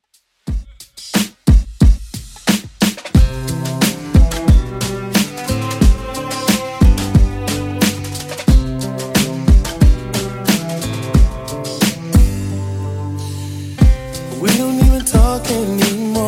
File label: 90s Redrum 90bpm